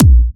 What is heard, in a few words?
Electro Kicks; Vengeance - Essential Clubsounds Vol.4; Bassdrums